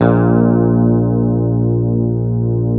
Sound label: Hamer 12, SUS, A#1, Bass; Sampleheads; Will Lee Bass Library